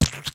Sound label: Mud Splat